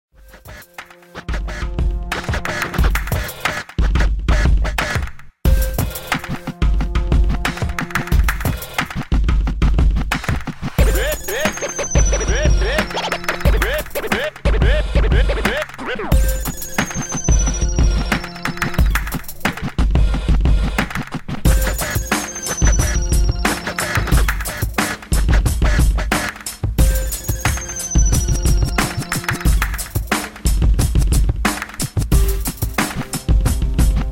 gemafreie musik

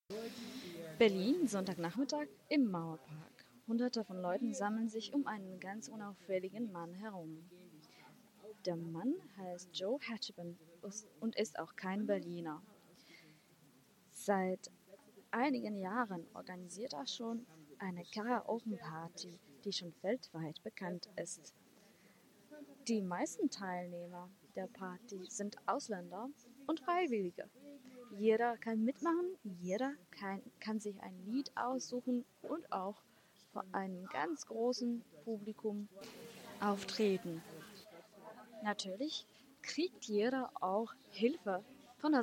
karaoke im mauerpark

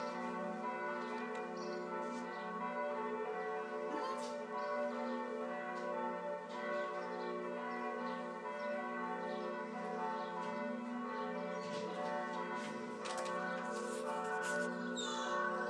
Geläut

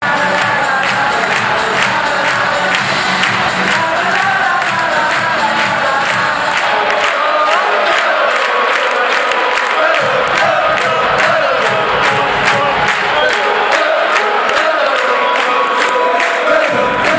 toooooooooooooooooooor, eishockey

